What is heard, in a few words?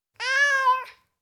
Tom Cat, Individual Meows; Cats